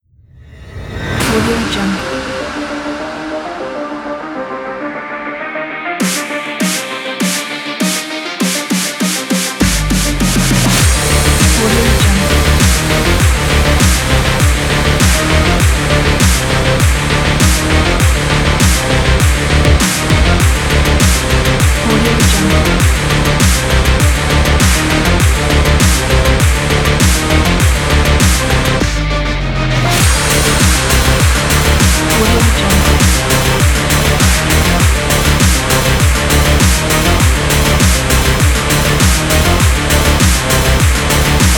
powerful sport electro

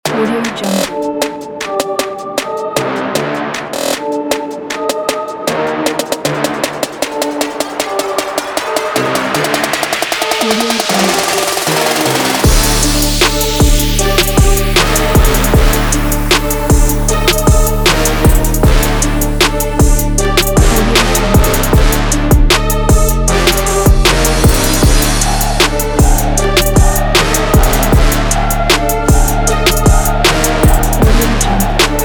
hard openner trap brass

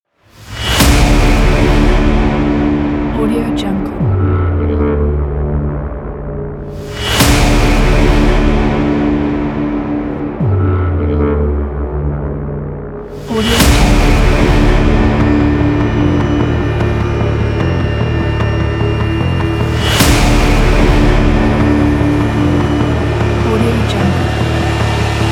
hollywood rising trailer

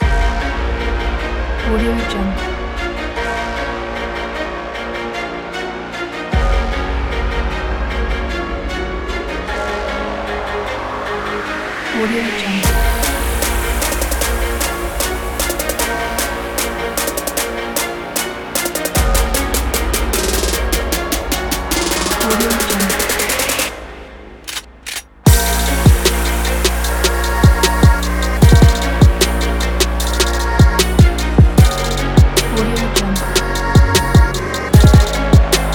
epic motivational trap and hip hop

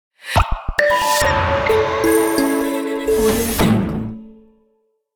modern positive logo

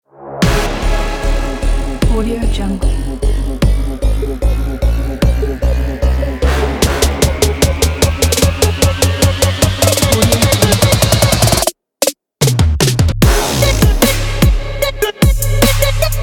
trap bass

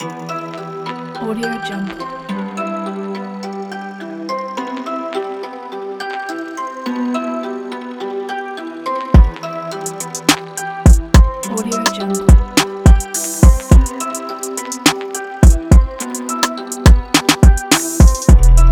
trap hip hop

